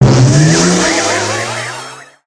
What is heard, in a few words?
Bouncers